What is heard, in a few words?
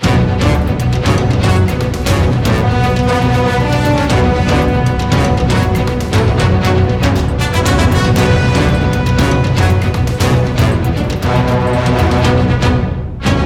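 Battle, BPM 118, B minor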